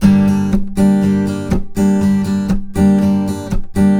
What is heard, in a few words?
Blues Guitar Samples, RIFF; ILIO; Fretworks